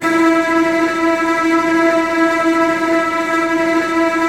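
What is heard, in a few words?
STR TREMOLO, Virtuoso Strings, E3, left; ILIO; Kirk Hunter Virtuoso Strings VOL-3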